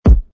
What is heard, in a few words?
Kick Slapper